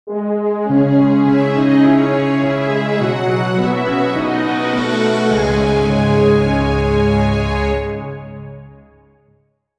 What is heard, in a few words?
Lose; pursuit force extreme justice; psp gamerip 2007